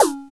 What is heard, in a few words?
FX Analog; Sound & Vision - Gigapack II